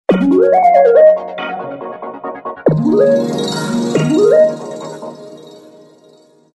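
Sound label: Video Call, ringtones; RAZR V3xx H3G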